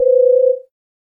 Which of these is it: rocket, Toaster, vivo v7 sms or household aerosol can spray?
vivo v7 sms